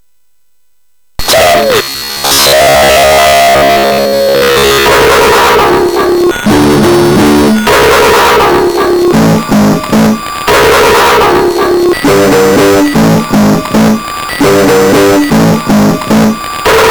Save, MOS6581R3; hvsc

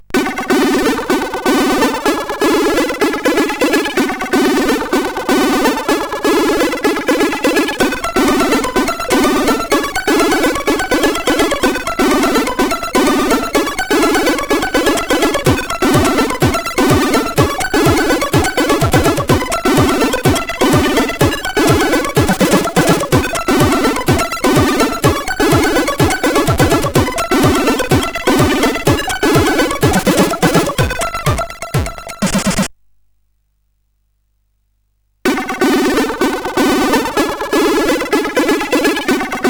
Latino, CSG8580R5

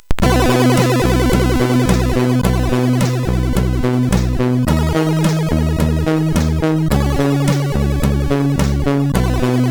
sid MOS6581R4, GAMES; Dark Caves

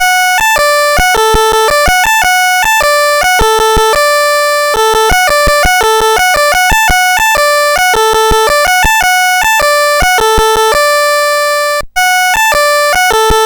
Bugle Music BASIC, CSG8580R5

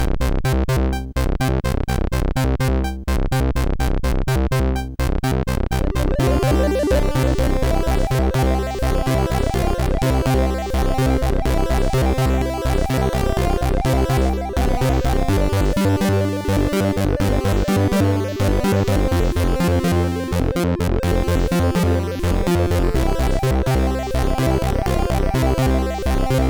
End Theme 25Hz sid MOS6581R3

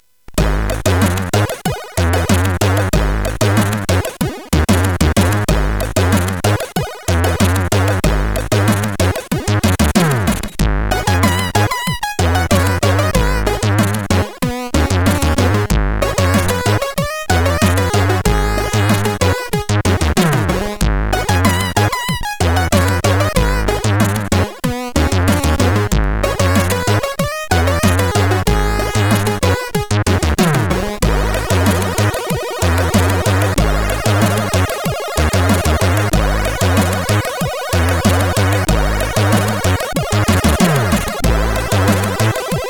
Quick Funk, sid; hvsc

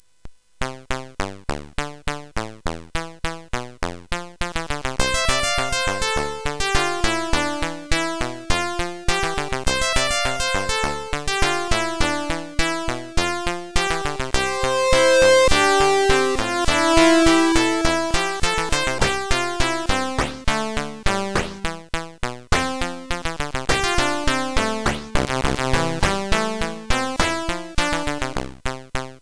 Super Synth Sample II CSG8580R5

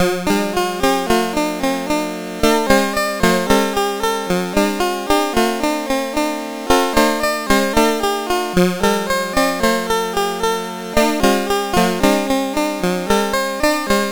Willow Pattern, CSG8580R5

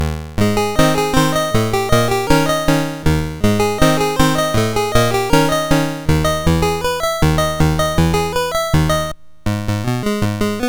Maple Leaf Rag, MOS6581R4; sid

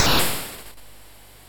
sid MOS6581R2, GAMES; Face Off

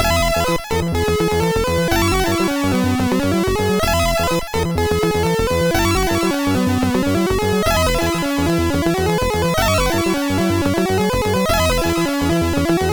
Fictitious sid MOS6581R4; hvsc

